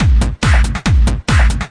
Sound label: 140 chugger, drum loops and breaks